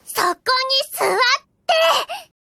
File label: match start; Chitanda Sagiri+blue angel+changed version